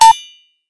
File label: time left; activities planetchunks; Cosmic Rage